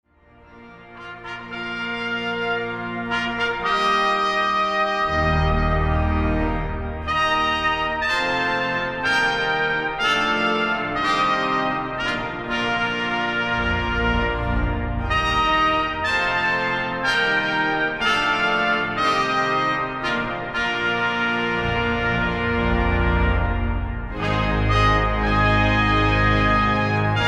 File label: Fanfare